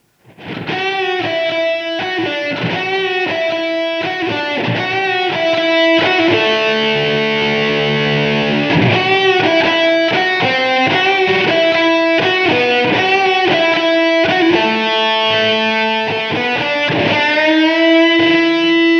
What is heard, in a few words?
E-Guitar; Vermona VSR 3.2